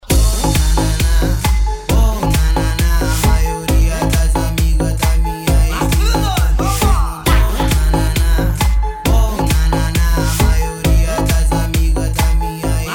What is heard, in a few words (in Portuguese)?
eletro e viola especial de eletro funk